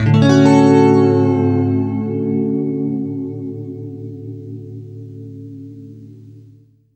FLESH CHORDS, Guitar

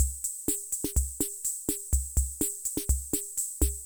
Loop, classic machines; arranger

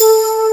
DG WAVE, DIGI SYN

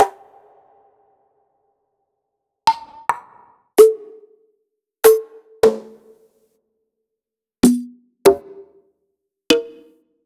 Percs

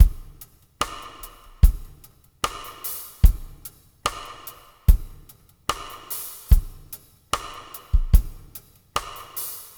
SST DRM WET, Drums; Spectrasonics; Liquid Grooves 1 & 2

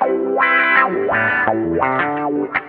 GUITAR

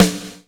SNRE TR 16; AKAI S-Series CD-ROM Sound Library VOL-2

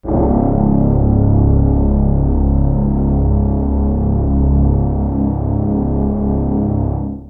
cl c#1, MIRAGE Cellos + Violin; Retro Sampler

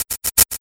HH clos fx, big beat; Big Beat Samples & More; AKAI; HHCLOS2 KIT

